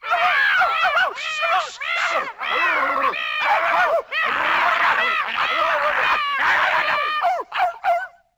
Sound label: dog n cat fight; Animals